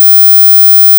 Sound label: 21000Hz; 聞こえたひと、あなたはすごいひとだ！